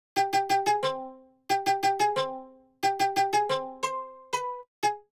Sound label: Santur, 090 Cmin